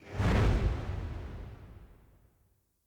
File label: Player Aim Jump Start